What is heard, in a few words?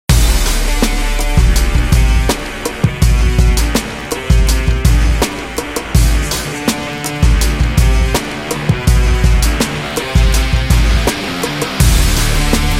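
Heavy, rock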